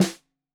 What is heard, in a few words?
Snare 65 Reg Stereo, Deeply Sampled Drums